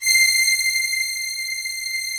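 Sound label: string, orchestral strings; Greytsounds VOL-1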